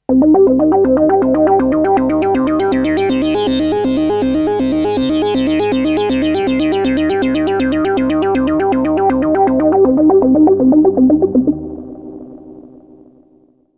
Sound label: matrixbrute2 arp